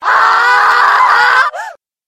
monster dullahan

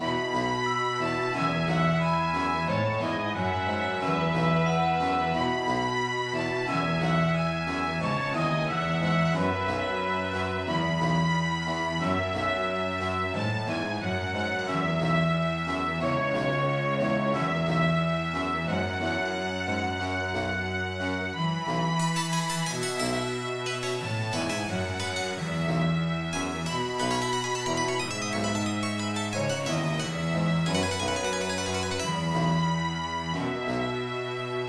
misc royalty

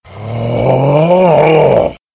monster barbarian mage

